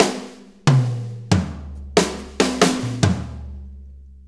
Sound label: 6-8 Fill, Drum Tools; Sonic Foundry (Sony Creative Software); Loops & Samples Drum Tools; Fills